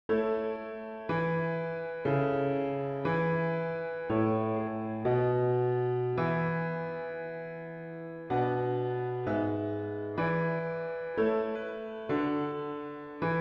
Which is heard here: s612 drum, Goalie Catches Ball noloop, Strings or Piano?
Piano